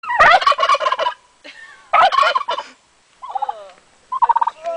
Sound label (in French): La dinde glougloute